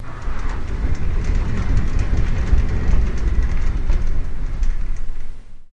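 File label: Shower Door Glass, Shower Door, Glass; Slide Fast With Roller Bearings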